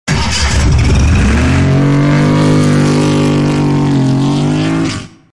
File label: Heavy Diesel Car